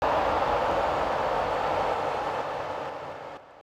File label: Cheer; rpg